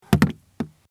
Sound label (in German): Auto Ablage